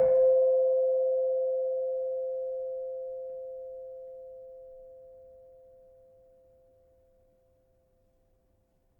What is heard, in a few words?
Keno 5 C, gravity harps tuned